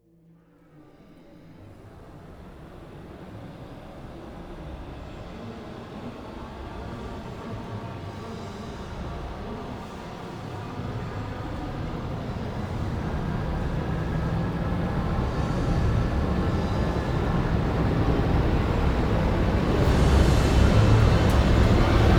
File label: orch and choir rise; immediate music